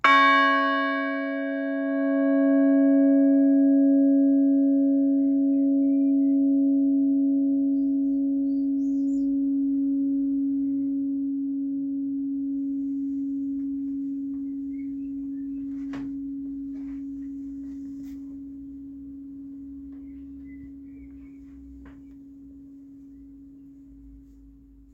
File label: bells, billingshurst four